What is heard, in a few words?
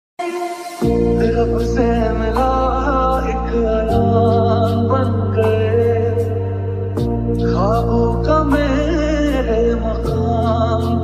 ringtone song